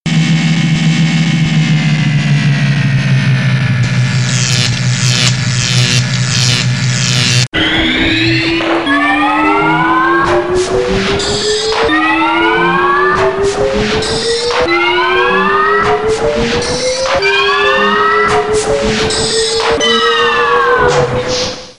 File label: Teleporter Ray